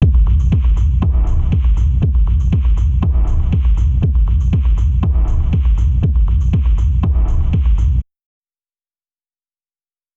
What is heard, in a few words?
Rolling Thunder 120bpm